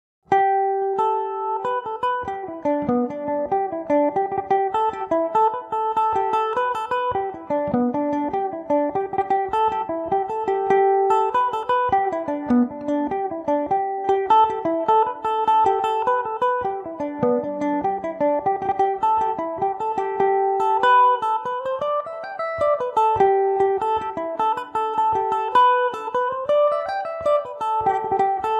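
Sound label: angry peeler G major revelation, mandolin; The Angry Peeler; Revelation Jaguar electric mandolin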